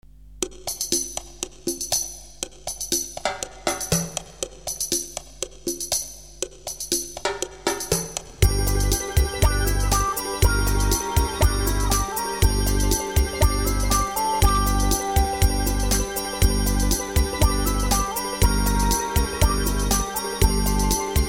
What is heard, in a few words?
clock